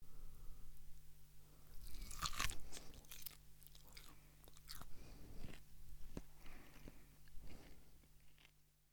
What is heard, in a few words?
Biting Into Meat And Chewing